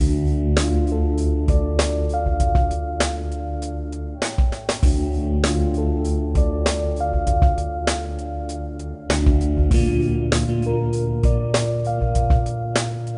no melody

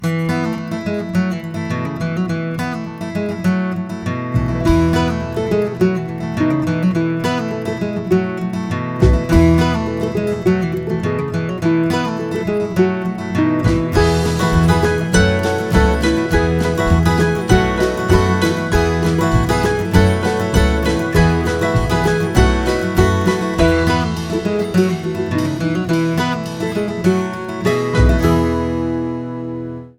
Hoedown, guitar and uke

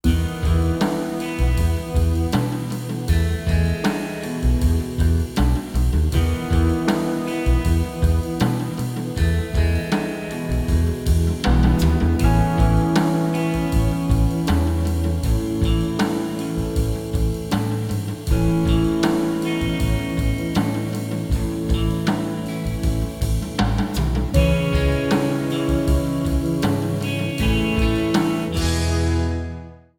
no ac gtr

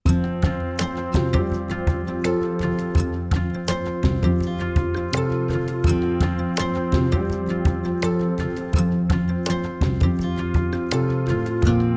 Morning Rain (no ac gtr)

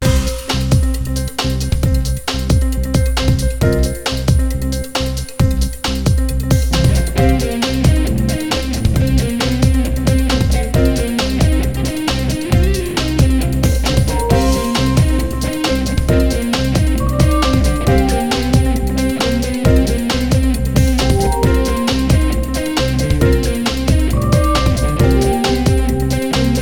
Jazzle Dazzle

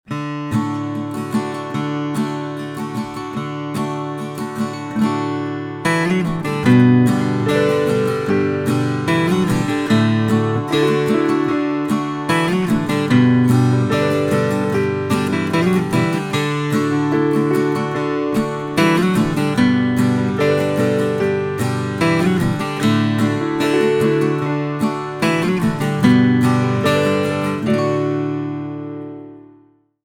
Campfire Song, 12 string

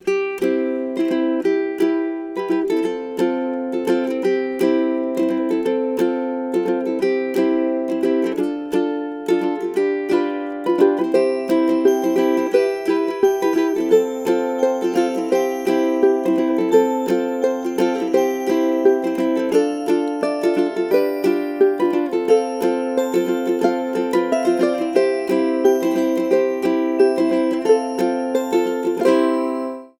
Step Lightly Small, ukulele songs

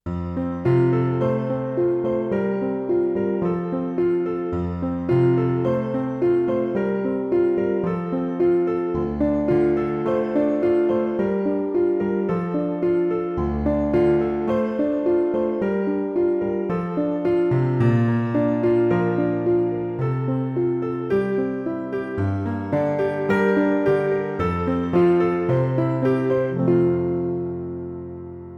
Graceful Nature (no cello)

